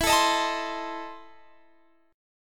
E Major 7th Suspended 4th Sharp 5th E, Guitar, Standard; strummed; JGuitar